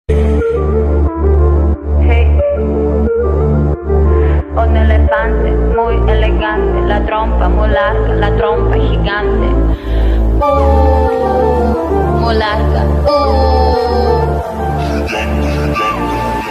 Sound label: english ringtone attitude ringtone